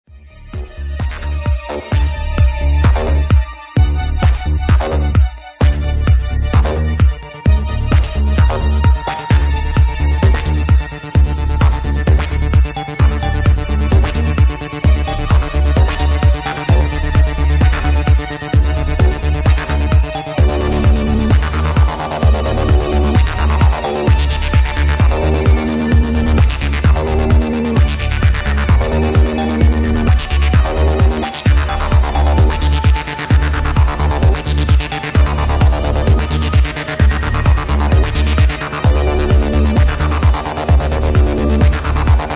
disco bass

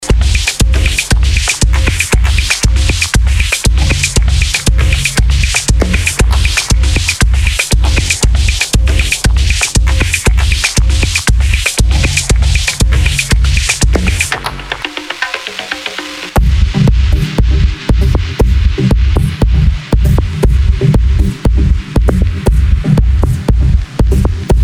marimba club

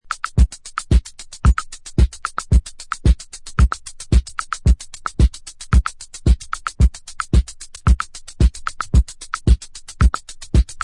drums

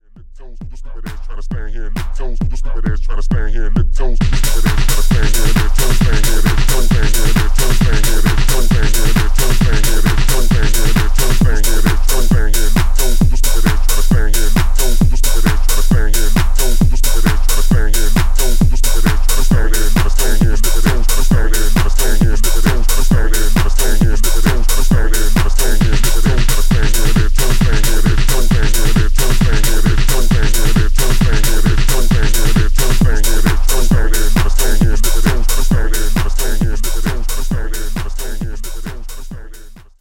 House Chant

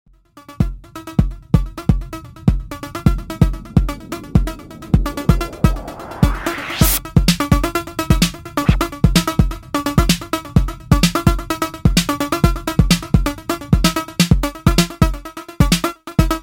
Drone

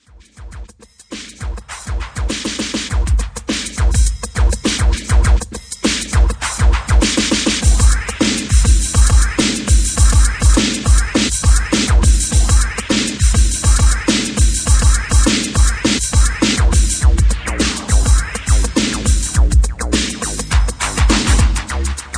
bassline

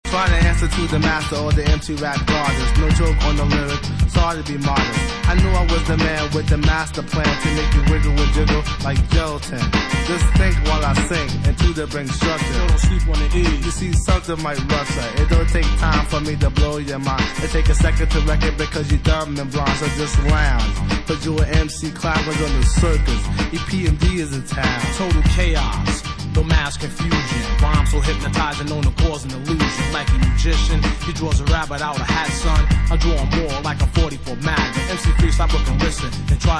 vocal

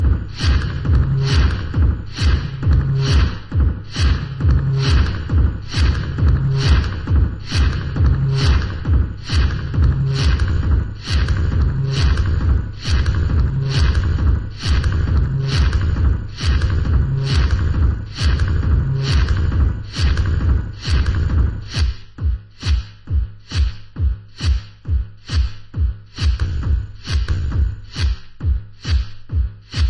glass hammers

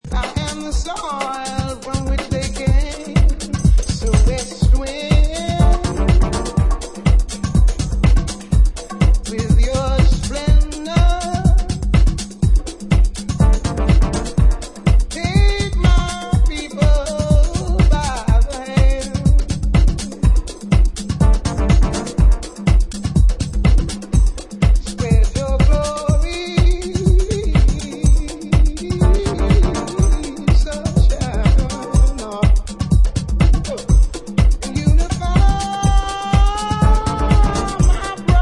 vocal

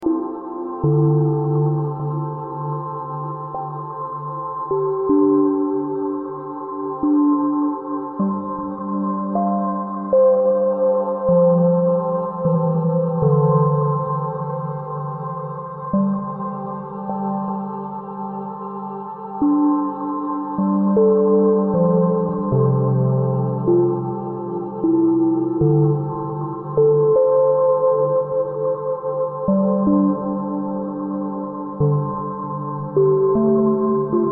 floral piano

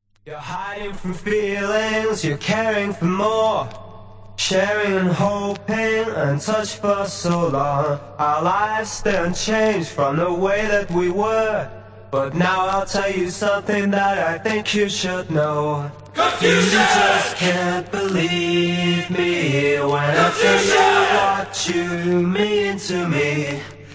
acapella

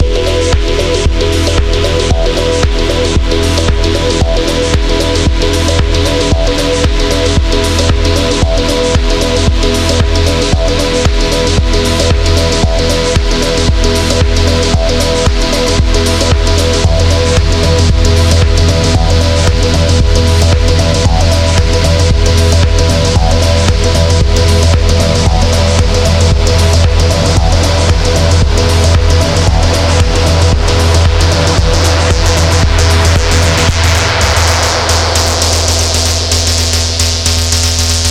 drone logic

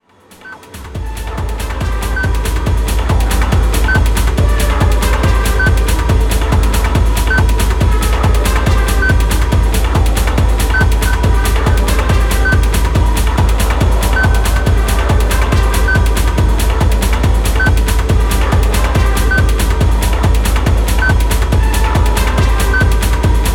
Heartbeat

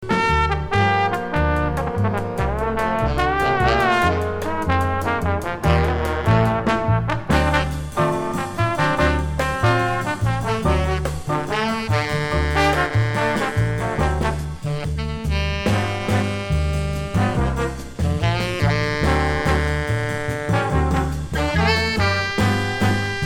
Nana - Sax Tenor

